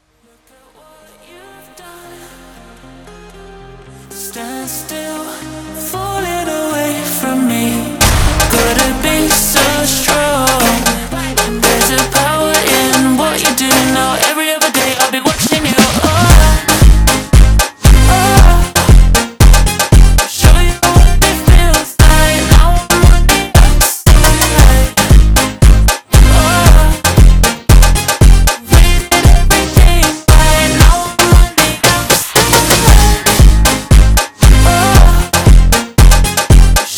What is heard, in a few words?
FUNK Slowed